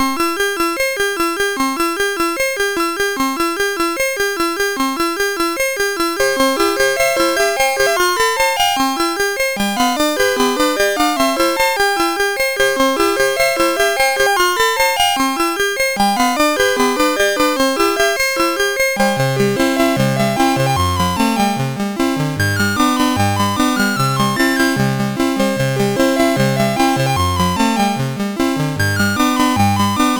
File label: MOS6581R4; Master Composer